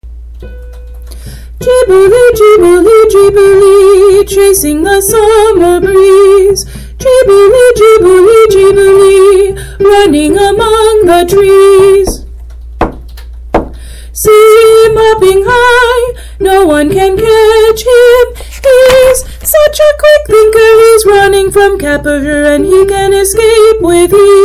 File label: soprano